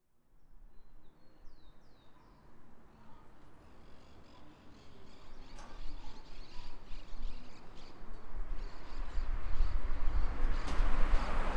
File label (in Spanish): La Florida, ruido